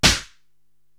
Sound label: SD Hydro Pop, Korg TR-Rack drum machine